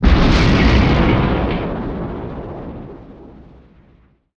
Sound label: damage vehicle; World Of Warships